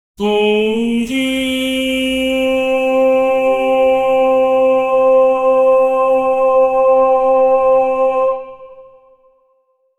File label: a dramatized vocal sound; dramatic